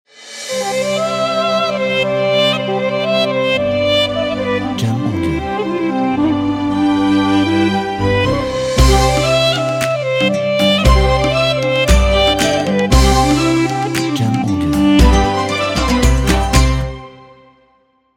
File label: Arabic Intro